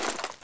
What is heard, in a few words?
satchel radio fidget start, weapons